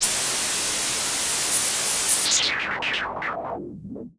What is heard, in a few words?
SH-09 Noise